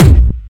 kickdrum a sharp key, Royality free kickdrum sound tuned to the A# note. Loudest frequency: 710Hz; kick drum samples